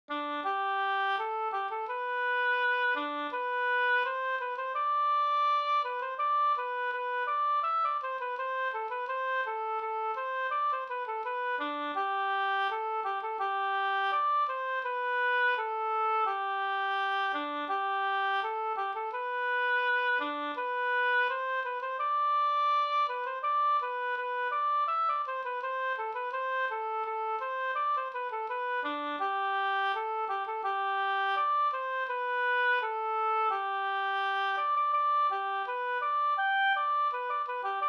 brudmarsch; Veckholms brudmarsch

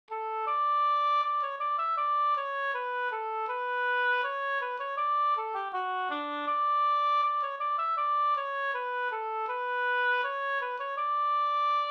ganglat; Kompanimarschen; Leksand

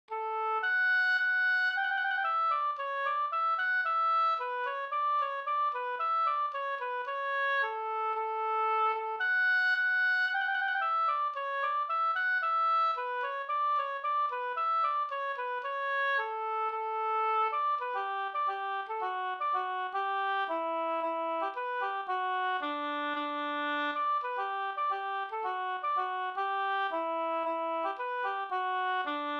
skanklat

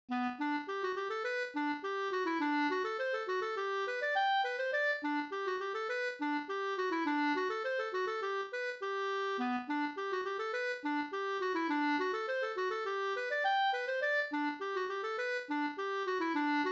polka polkett; e Sahlstrom Up NSG157

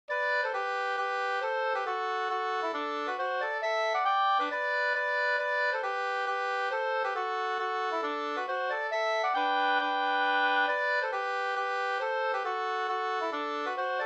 mazurka, 2st

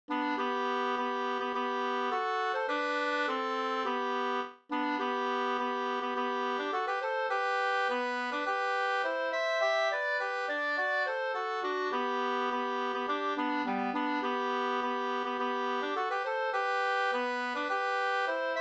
folkdans, 2st; Svensk maskerad

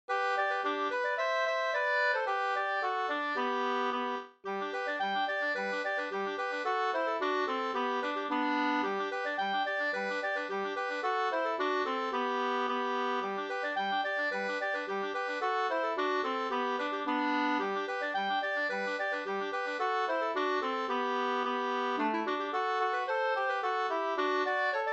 folkdans, kadrilj; Landskronakadrilj 2st V2

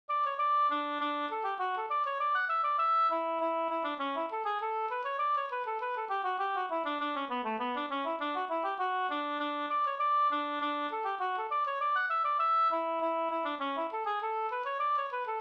engelska

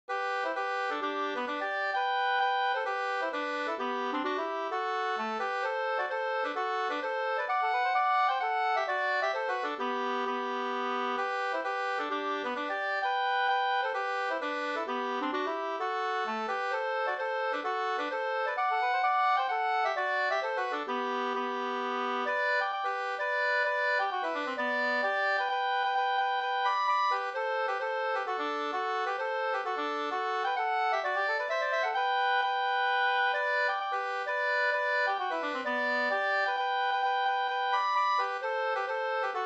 hambopolska; fr Jorm

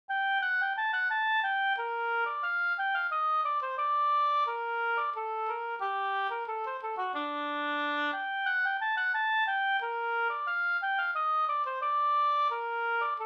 polska Senpolska

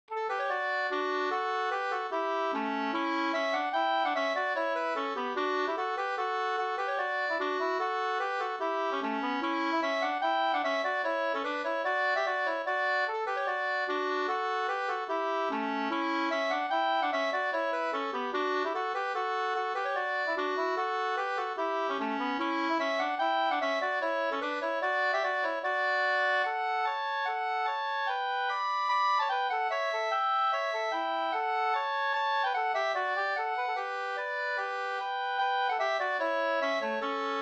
schottis; Varldens basta schottis e Plog-Anders Rattvik 2st

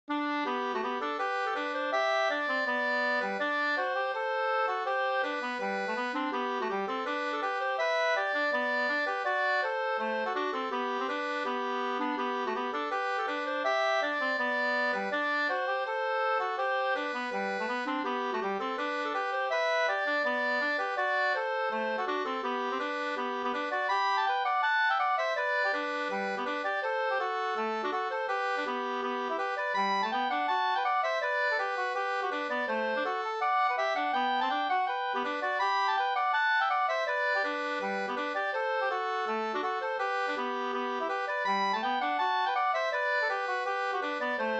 schottis; Femton karingar i Lundstroms kok Sd 2st

